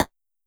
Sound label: Snares, Elektron MachineDrum SPS1 MKII